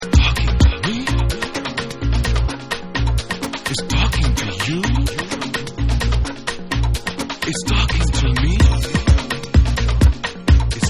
TALKING DRUM

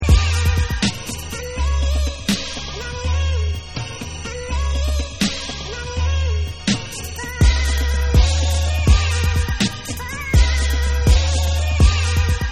Instrumental

